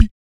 VOC PERC, Vocal Planet Jazz FX BASS PERC

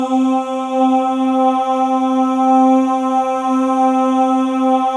MALE AHS, Symphony of Voices; Spectrasonics

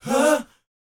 BOYZ, Vocal, R&B Dance

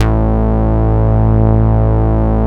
MOOG A2F; 90S MOOG

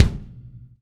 R KICK; Sonic Reality; Interactive Drum Kits

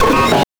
BANK2 SFX, NES DPCM; MIDINES

